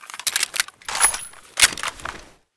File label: vector misfire